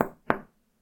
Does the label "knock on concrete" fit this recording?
Yes